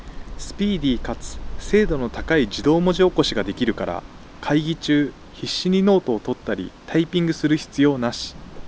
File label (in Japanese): 外 ウィンドジャマーあり